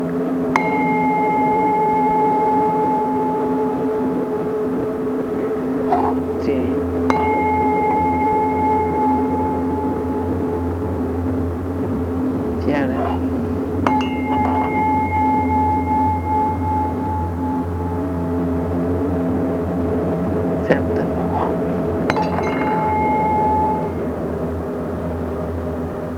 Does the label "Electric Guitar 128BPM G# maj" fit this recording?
No